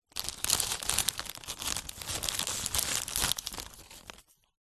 food potato chip crisp remove from packet; zapsplat

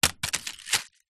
foley paper scrunched up small land wood; zapsplat

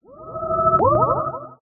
synth space weird synthy sci fi energy wave; Jessey Drake

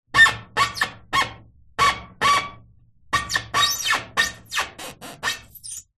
vehicle car tyre rub squeak metal

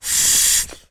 lilo rubber ring blow into inflate single; zapsplat

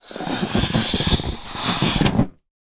balloon inflate noisy handling; zapsplat

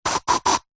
household deodorant spray

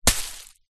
impact rock small land amongst leaves bushes

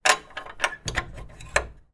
household gate bolt lock movement

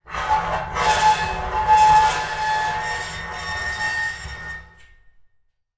foley metal scrape shrill

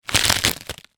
food potato chips crisps crush in packet; zapsplat

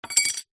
foley medal metal drop on wood floor

